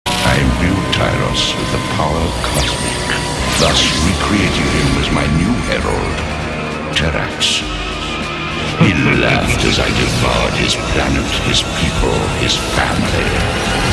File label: tyros